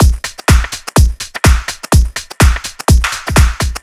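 Swing percussion, Drum Loops 125bpm, Nu Dance Electro; Zero-G - Soundclash; Swing Injection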